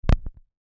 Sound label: Record Needle Down, Record Needle Set Down; DJ; Musical; Blastwave